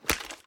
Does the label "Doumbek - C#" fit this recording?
No